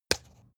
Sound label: spice jar smack open low; industrie wirtschaft; gastronomie